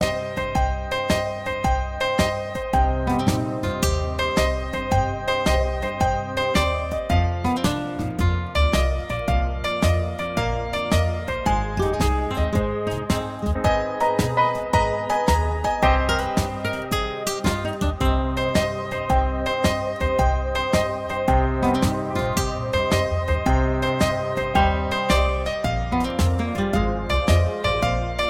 instrumental ringtone

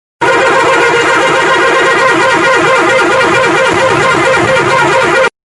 BUS HORN RINGTONE

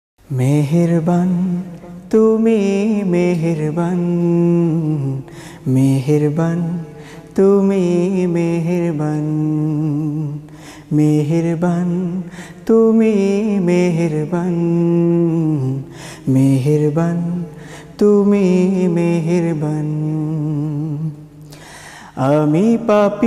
BANGLA RINGTONE

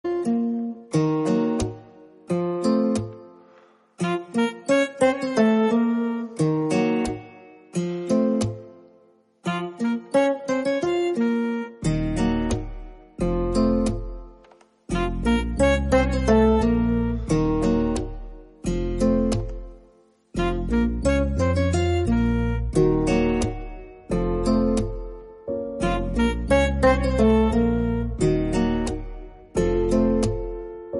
INSTRUMENTAL RINGTONE, MALAYALAM

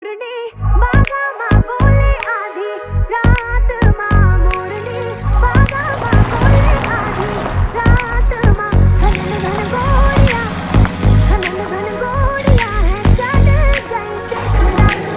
RINGTONE, HINDI SONG

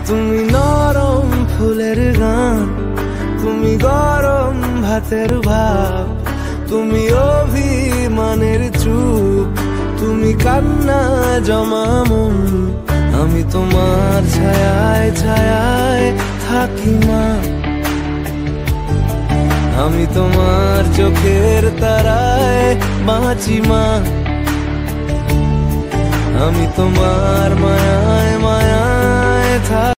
BENGALI RINGTONE

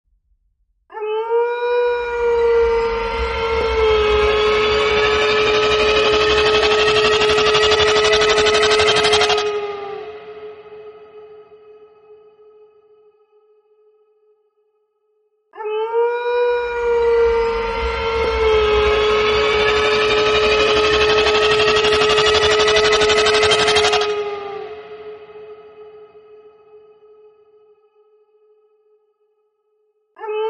DOG BARKING ALARM RINGTONE

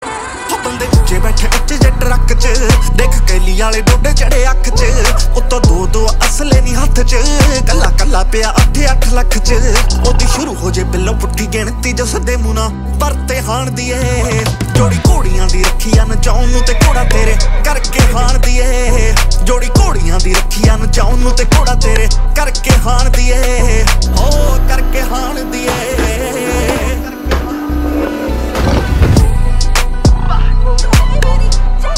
PUNJABI RINGTONE

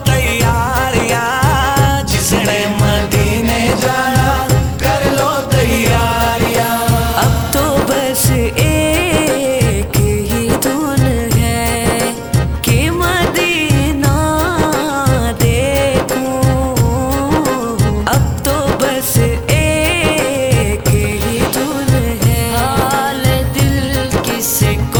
NEW NAAT RINGTONE

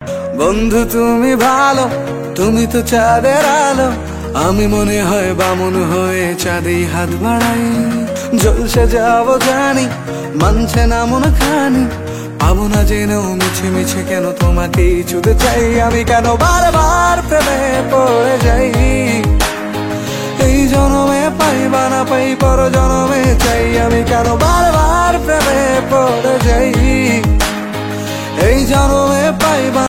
BENGALI RINGTONE

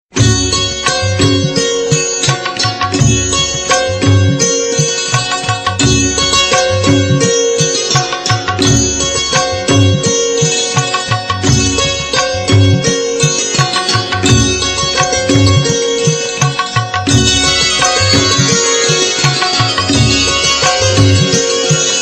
INSTRUMENTAL RINGTONE; BHAKTI

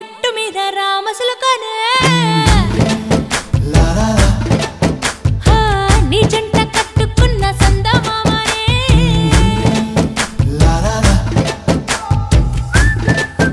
RINGTONE, TELUGU SONG

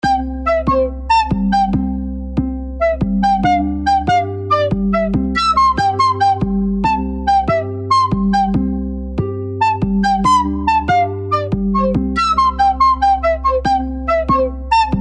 ALARM FLUTE RINGTONE; SAMSUNG

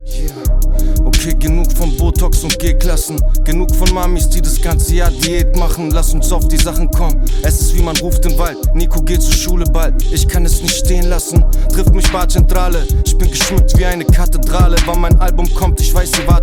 GERMAN RINGTONE

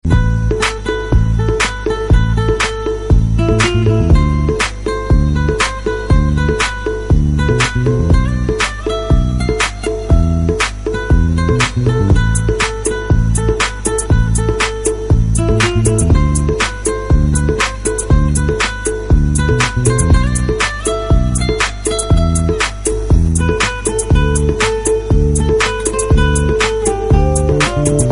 GUITAR RINGTONE

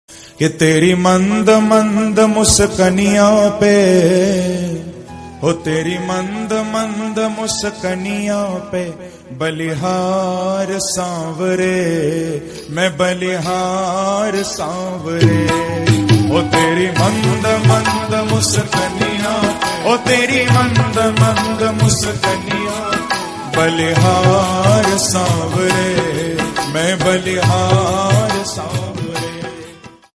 KRISHNA HINDI BHAJAN RINGTONE; BHAKTI

